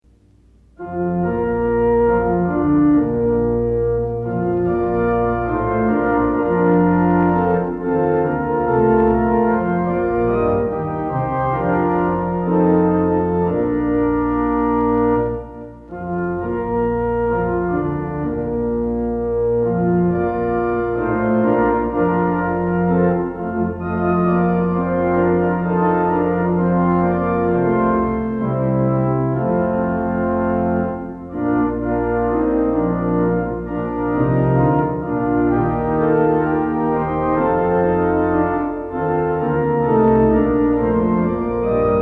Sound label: Organ Music for Worship Services; O Jesus Christ, Redeemer, Lord; Sixteen Liturgy Hymns